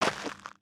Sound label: Foot Dirt Sneaker Walk, footsteps